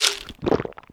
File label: pills use